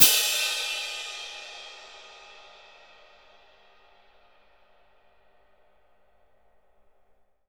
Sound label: CYM, Percussion; ILIO; Synclavier Percussion 2 - World & Orchestral